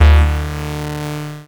Multimedia Internet CD-Rom Flash Buttons Hard

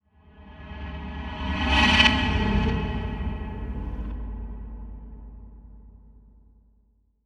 Title Transition Swell

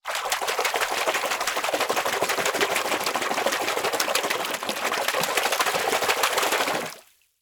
Liquid Water Water Hands Splashing In Water Fast

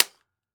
Weapon Gun Machine Gun Thompson Sub Dry Fire; AdobeCC

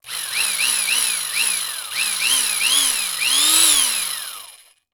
Industry Tool Drill Drilling Multiple

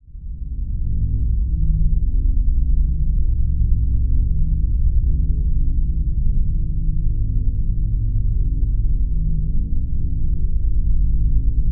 Drone Tonal Deep Ethereal Powerful Bass; Production Element Imaging; AdobeCC

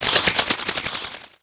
Animation Electronic

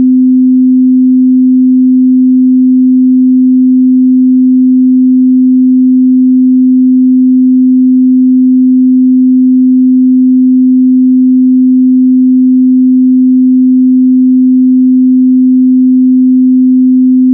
Tone 256Hz, Test Tones

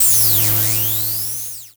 Title Transition Electrical Power Down; Production Element Title Transition

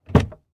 Transportation Car Monte Carlo Open Door Interior

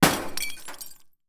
Crash Glass Break On Metal; Crashes

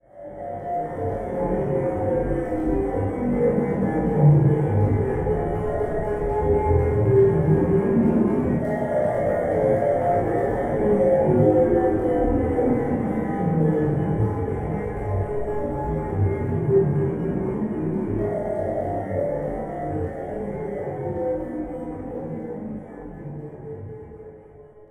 Title Transition Stab Dark Long

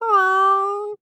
Animal Mammal Carnivore Cat Domestic Cat Meow; animals; AdobeCC

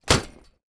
Impact Bag Duffle Bag Drop Metal Surface; Impacts

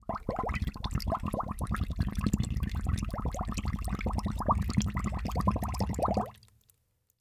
Liquid Water Water Bubbling From Plastic Bottle

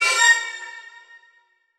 Transition Bell Hit; Production Element Title Transition

